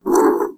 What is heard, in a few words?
kangaroo grunt; Animals